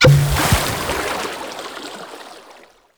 Root Cave Cork Burst; Ambience; Props; Neathuns Root Cave

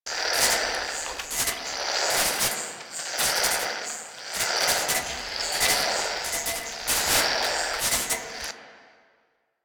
Infested Safe Deposit Box Loop; Objects; Warframe; Storage Container

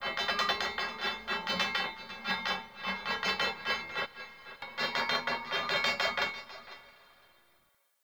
Castle Misc Metal; Ambience; Midrath Island

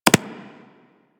Bullet Impact Generic; Surface

